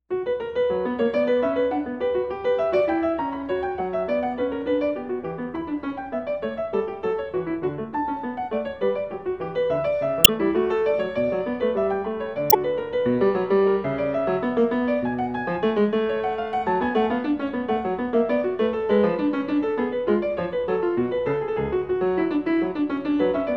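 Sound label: Bach French Suite 4 allemande, piano; FRENCH SUITES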